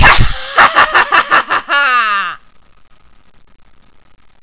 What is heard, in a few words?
laugh